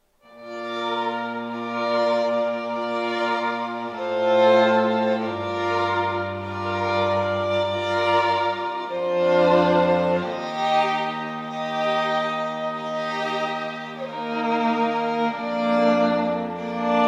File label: strings, String Ensemble; classical musicians